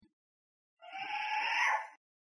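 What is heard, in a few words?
Short-eared Owl yip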